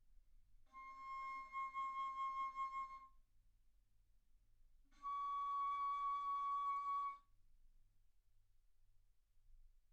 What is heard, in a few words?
Bass Flute ff C6 Db6